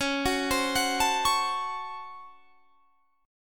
Db Major 7th Suspended 4th Sharp 5th Db; arpeggiated

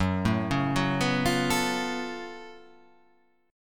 F sharp Minor Major 11th

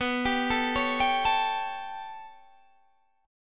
B 7th Suspended 2nd Sharp 5th B, Guitar, Open D

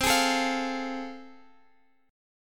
A Minor Major 7th Sharp 5th, C

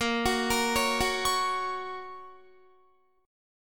Bb Minor Sharp 5th Bb down Guitar; Bbm#5 arpeggiated

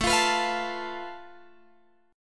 A Suspended 2nd Sharp 5th, Guitar, Drop D

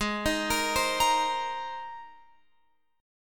Ab Major Add 9th Ab, Guitar Open F; Abadd9; arpeggiated

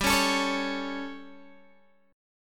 G Suspended 2nd Flat 5th G Guitar Open G

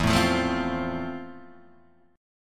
F Major, guitar chord

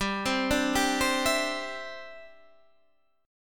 G 6th Suspended 4th; G6sus4 arpeggiated

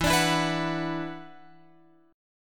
E 7th Suspended 2nd E down Guitar Open F; E7sus2; strummed

